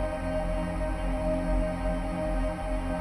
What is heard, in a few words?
Random Pad